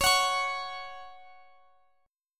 Gb 5th Db, guitar

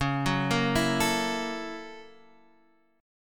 Gb 7th Sharp 9th Db, guitar

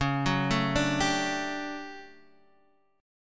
D Major 11th Db, chord

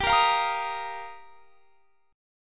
G Minor 7th Sharp 5th; Gm7#5; strummed; x,22,20,22,23,23

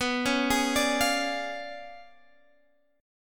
Db 7th Flat 9th

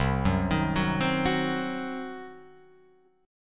C Diminished C, Guitar Open F; arpeggiated; JGuitar

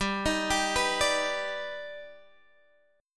Eb Augmented Major 7th G

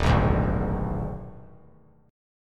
Eb Major 7th Suspended 4th Sharp 5th; strummed; 3,6,5,6; EbM7sus4#5

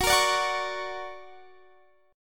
D Augmented Major 7th F sharp, guitar

